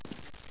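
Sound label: player step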